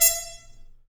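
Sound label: Harmon Stabs, Brass; Roland L-CD702; Harmon Amb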